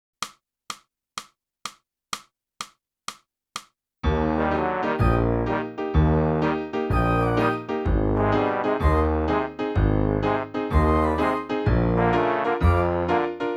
clarinet

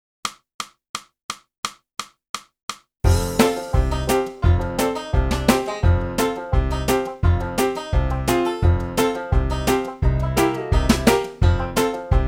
sax

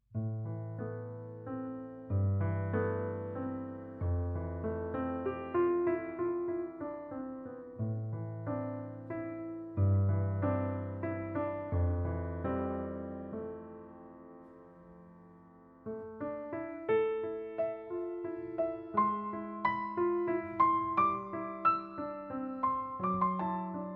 piano

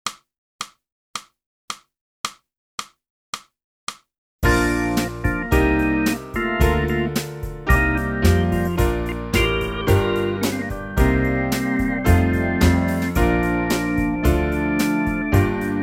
sax

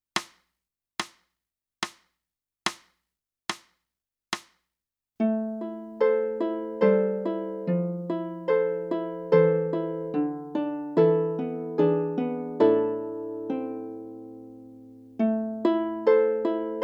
flute

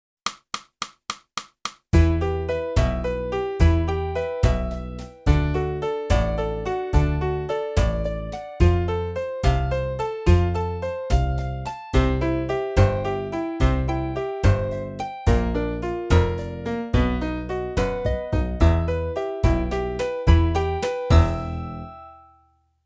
flute; Boosey Woodwind Method: Flute (Book 1)

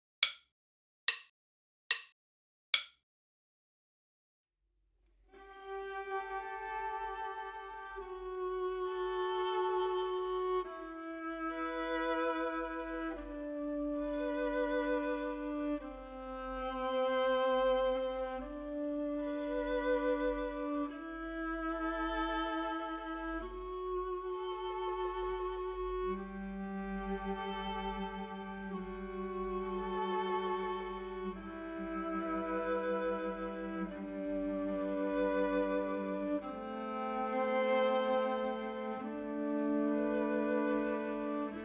minus Violin I

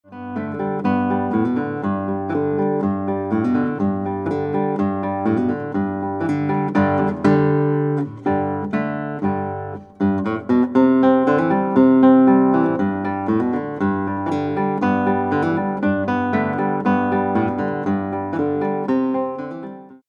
Guitar

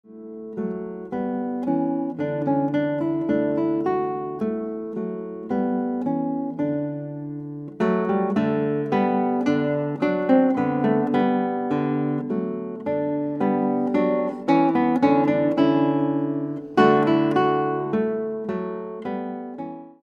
Guitar

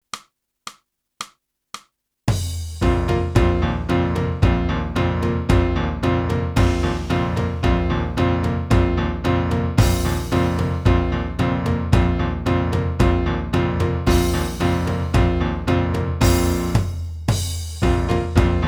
clarinet; boosey method

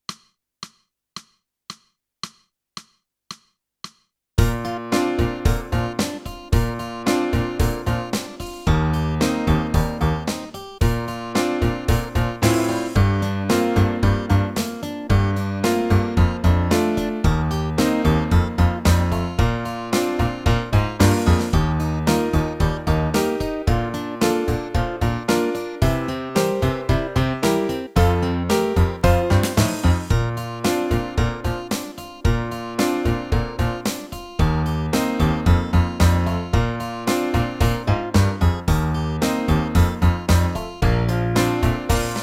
clarinet; boosey method

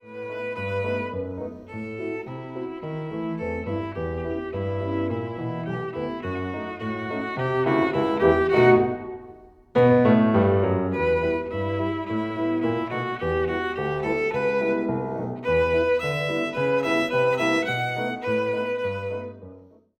VIOLIN; Trinity College London violin exams for 2016-2019

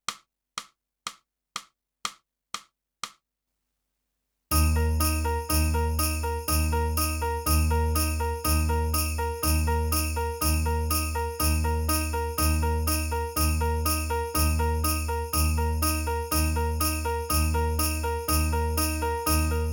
sax

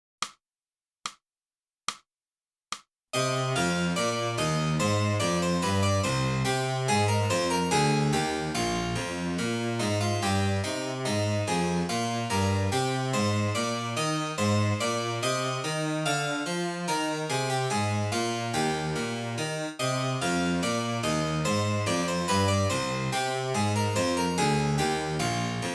sax bourree

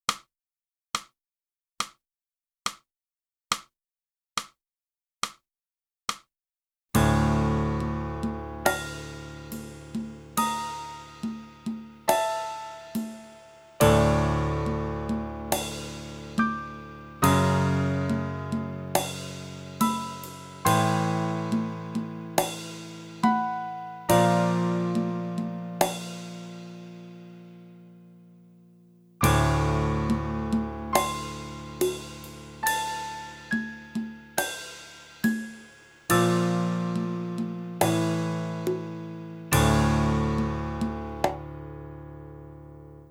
flute; boosey

